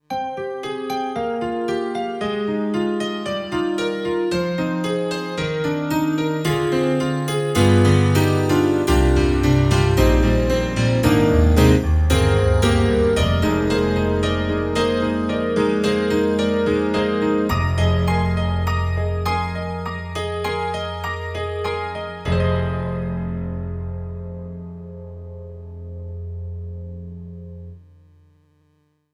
KORG X50 Romance Piano

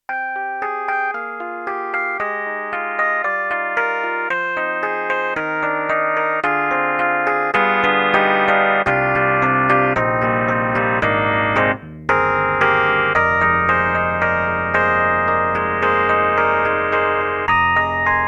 YAMAHA MU1000EX Old EP

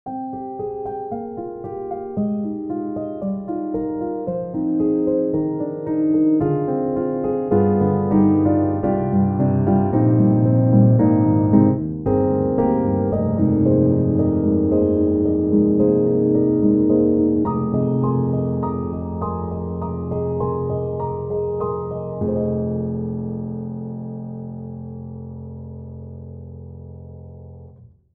An introverted Piano; Steinberg; HALion 7; Verve

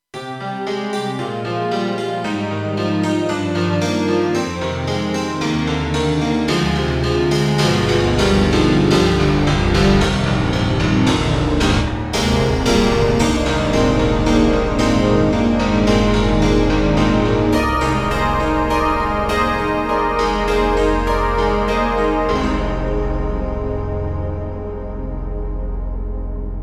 KORG TR-Rack Power Piano

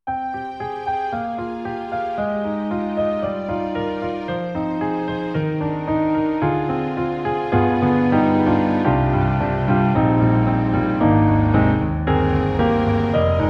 Piano and Str; SD-90; EDIROL

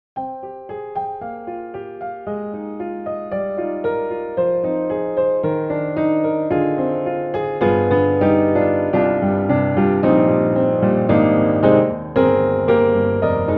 Soft Resonant Modern Upright; Upright Pianos; Synthogy; Ivory II

